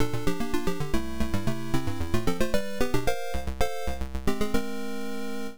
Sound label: Game Over; Relevos Snowboarding; MSX; Alternative 2, Unused